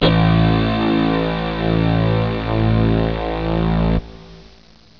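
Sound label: SYNTH BASS A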